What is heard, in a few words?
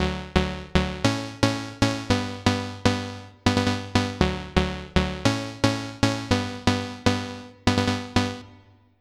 Trance